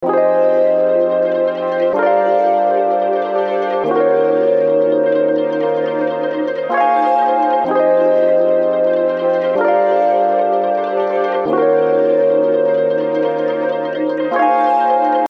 SYNTH LOOP 126BPM F; Volac

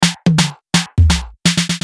Fill; Complice

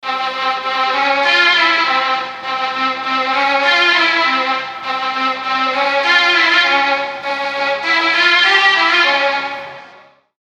Fm 100, Ethnic MIDI Melody; Trek; Unison Ethnic MIDI Melody Collection

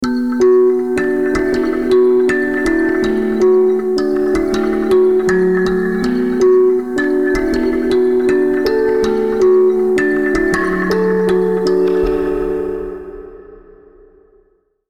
160bpm, Minor Pentatonic; MIDI; Unison Minor Pentatonic Advanced Scales MIDI Collection

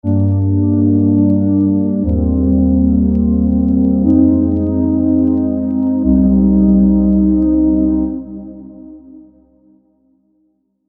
Aeolian Prog; Unison Aeolian Advanced Scales MIDI Collection

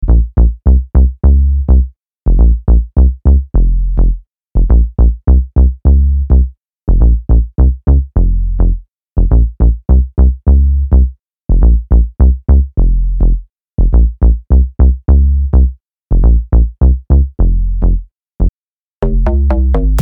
Bass Loop; Unison Bass Loop Essentials

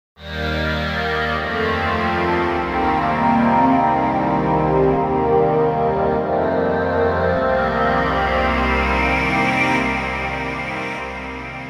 Pad, Techno; Umbrella; Unison Techno Collection for Serum

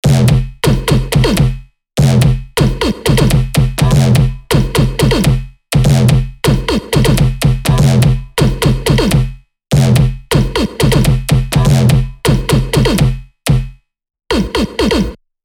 Bass Groovy; Unison Artist Series Essentials

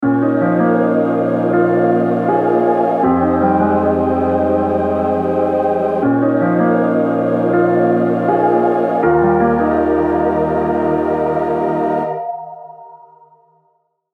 Keys, Omnisphere; Unison Omnisphere Starter Pack

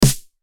Snare; Poor

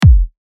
Kick; BIJOU; UNISON

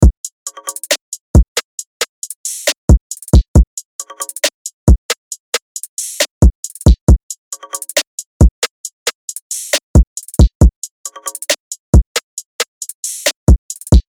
DRUM LOOP Machine 136 BPM Dbm; Unison Beatmaker Box

